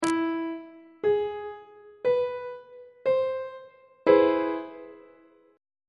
d double flat augmented major 7th 1st inversion on treble clef